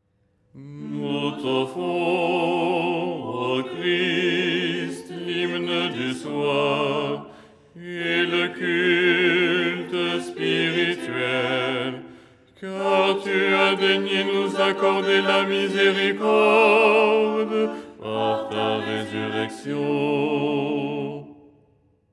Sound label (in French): basse